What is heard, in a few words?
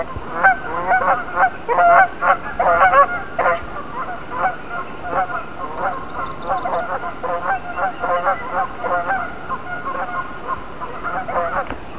goose